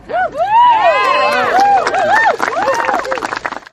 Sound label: woohoo applause